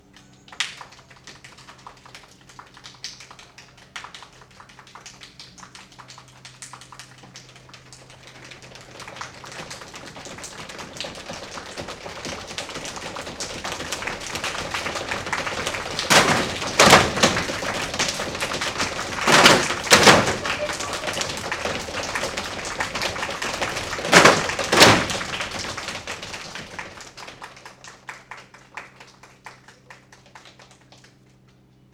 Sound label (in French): Orage